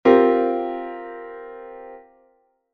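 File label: C7sus4 chord; ジャズピアノ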